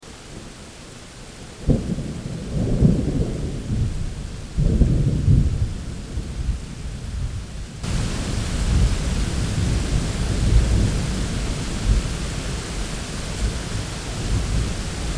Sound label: Thunder Showers